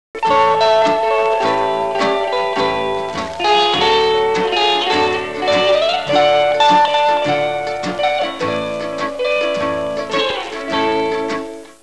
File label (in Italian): musica strumentale